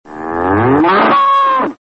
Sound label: Mooo!; Voice Cloak Plus; Acousticons